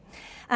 Birds

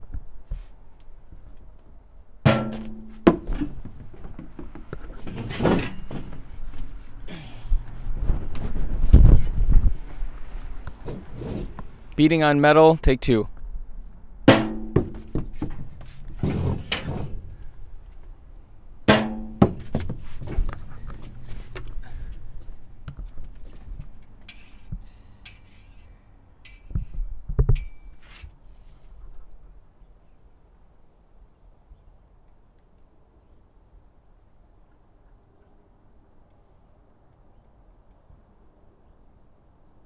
Metal Hopper, PUMPKIN HARVEST FOLEY

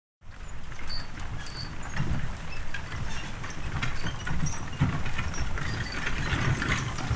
Horse Drawn Wagon; Electa Quinney

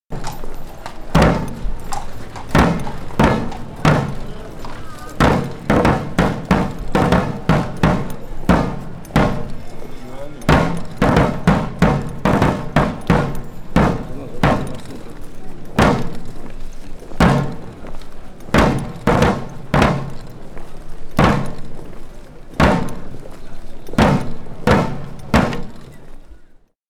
Infantry March Drum; Electa Quinney

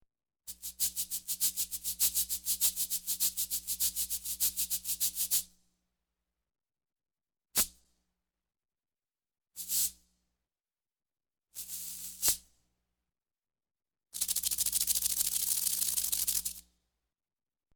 Cabassas Accent Rhythm; Electa Quinney

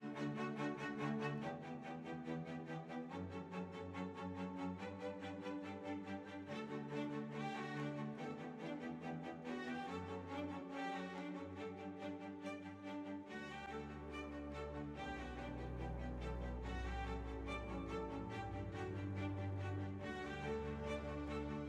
music; Quilt Show 2022; Ken Burns

